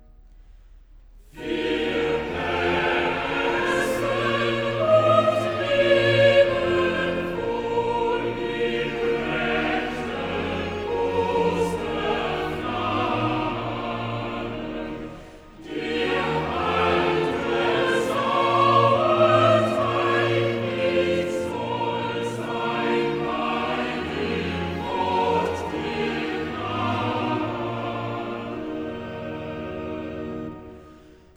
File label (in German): Choral; Cantata, BWV 4 'Christ lag in Todesbanden'; Versus VII; 'Wir essen und leben wohl'; Bach; Eisenach; Easter Sun & Mon